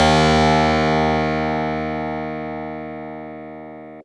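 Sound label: Fuzz Clav DX100 D#0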